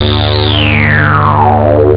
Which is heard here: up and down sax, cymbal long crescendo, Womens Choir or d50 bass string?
d50 bass string